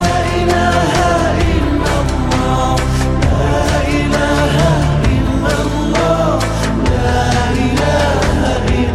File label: ramadhan ring tune